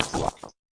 fx drum

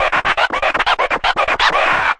train, Scratching and Turntablism; DRUM LOOPS and BREAKS

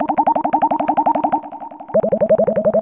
170 bubble-synth-line, synth and electronic loops

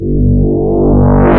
reverse sweep, Synth

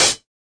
hat

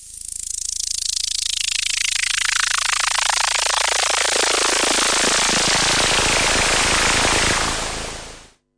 Yamaha CS5, noise sweeps

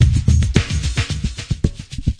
thick echoes, 110 bpm, drum loop; 101 to 110 bpm

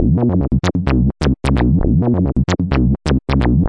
130 gurgle acid bass

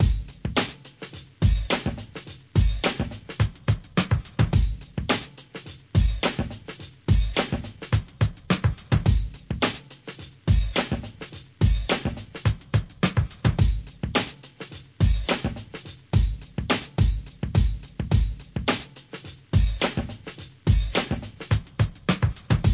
bleepy beats, 106bpm; bleepy mahem

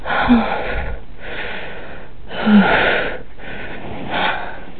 breaths, Silly Lo-Fi Orgasms

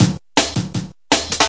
drum loops and breaks, 161 bpm; 161 to 180 bpm

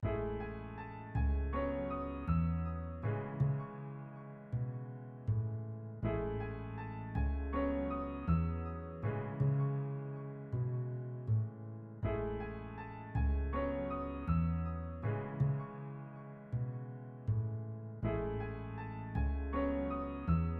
Piano song

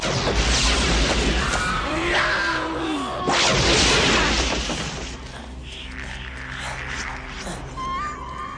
star trek gods death

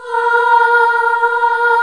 boy choir Bb3; Boys Choir; MULTISAMPLED

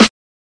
Snares; single hits

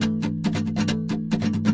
138 plucky-rhythm-guitar, melodic loops

